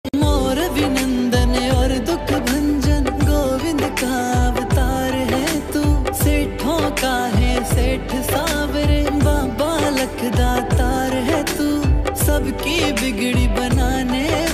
Khatu shyam ji song

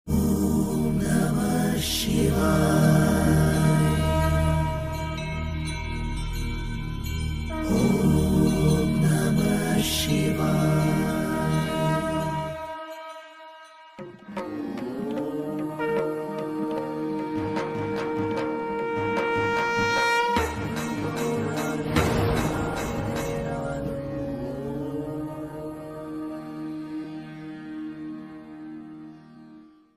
Om namah shivaya ringtone bhakti ringtone

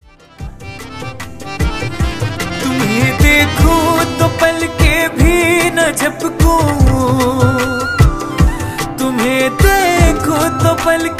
Shyam Bhajan

